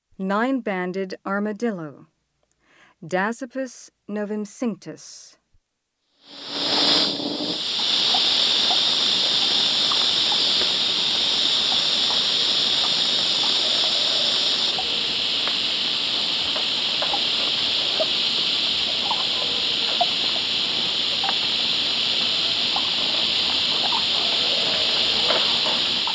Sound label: Nine Banded Armadillo; S. America